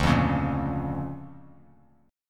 D Minor Major 7th Sharp 5th, D, Bass Standard; 10,8,11,3; DmM7#5 strummed; JGuitar

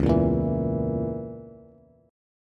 Db Minor 6th Db Bass; Dbm6 strummed; JGuitar

